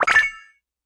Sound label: In Mission Achieved